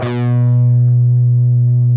PRS C, Guitars, LEAD

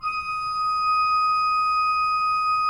D5, Cathedral Organ; ILIO; Propeller Islands Cathedral Organ

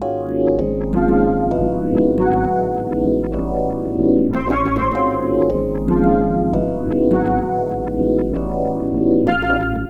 Melody, Hip-Hop

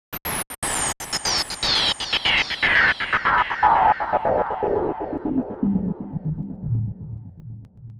Gated Noise 120BPM; creative gate; One Shots; musicradar

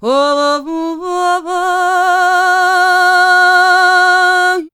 MEH1 VOX, Balkanish Traditions

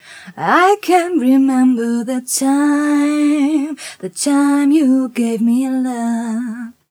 f mel g, fem Vocals

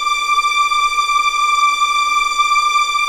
D6 EXP MF, VIOLINS; East West; The Ultimate String Collection

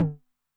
Mid Tom High Tune Short Decay, 909; classic machines; 909mod; MT - MID TOM

